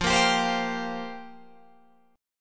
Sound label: F Suspended 2nd Suspended 4th F down Guitar Open G